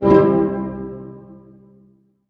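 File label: harp hit C major; Sound On Sound - Peter Siedleczek's Orchestra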